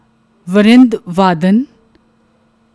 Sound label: Varind Vaadan, Indian Orchestra; instrumental music